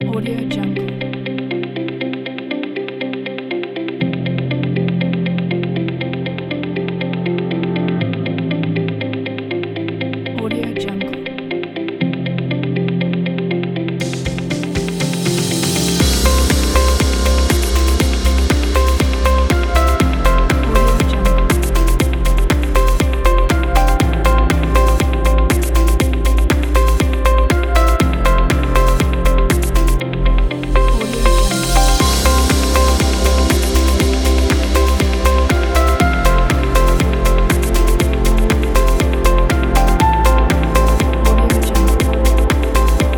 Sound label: tropical corporate